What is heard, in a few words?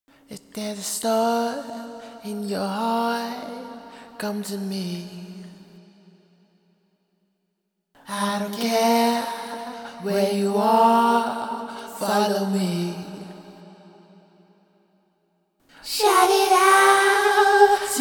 Vocal No Compression